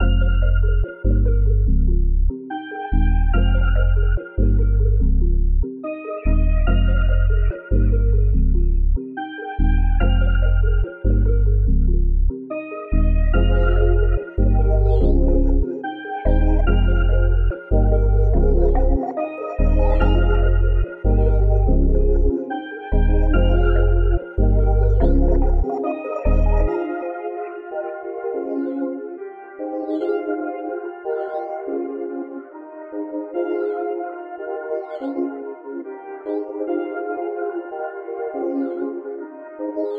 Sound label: Sunrise 144 BPM F Min; Cymatics; 2022 Melody Collection